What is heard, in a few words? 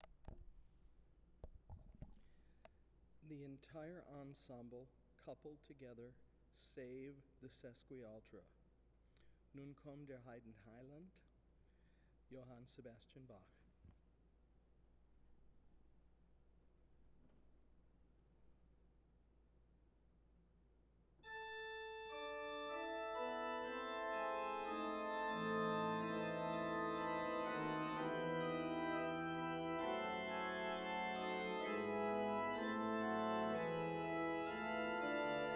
Tutti MINUS Sesquialtera